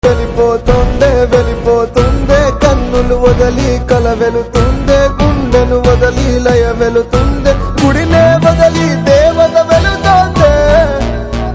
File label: Telugu Ringtone